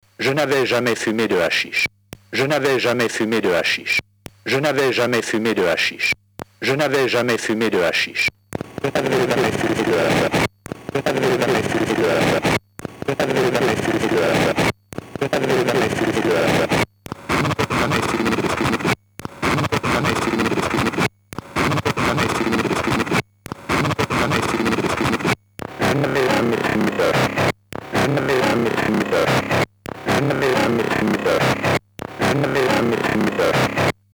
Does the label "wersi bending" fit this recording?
Yes